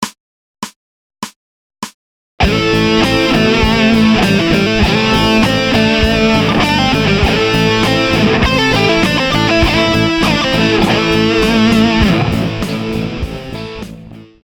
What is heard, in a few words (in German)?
Pentatonik Lick, gitarre; Pack 1